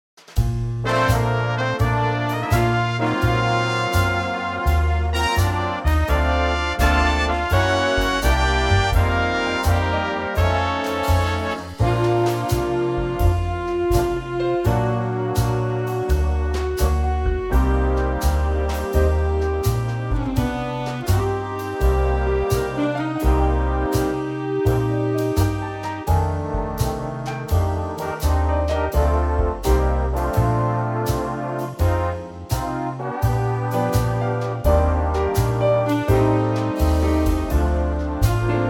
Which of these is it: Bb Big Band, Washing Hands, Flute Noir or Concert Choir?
Bb Big Band